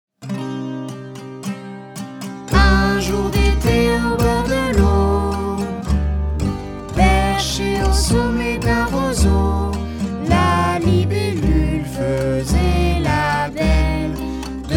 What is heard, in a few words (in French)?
Chanson La libellule et le papillon